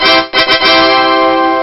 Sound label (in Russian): zvuk fanfary flourish